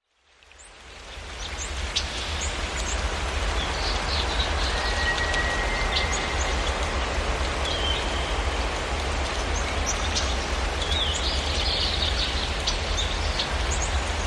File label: Placid Lake Creek Birds Atmos